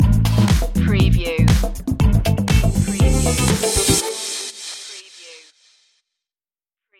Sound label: salsa funk stinger